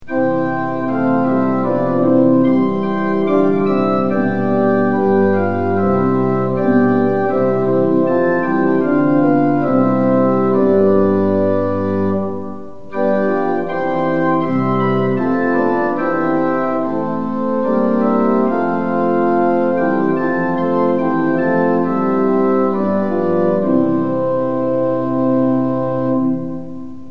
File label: tune; hymns; lillenas